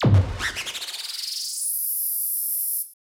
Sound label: FX Industrial 140